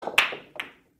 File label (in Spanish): billar choque